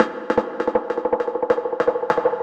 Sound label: Faster Snares Beat; Electronica